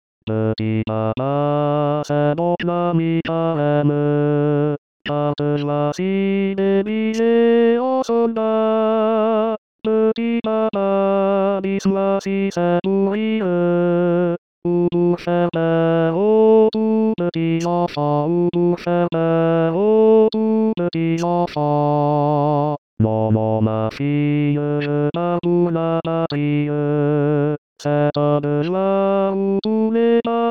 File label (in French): La Strasbourgeoise voix